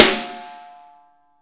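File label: snare ambient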